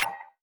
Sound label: volume check; Escape From Tarkov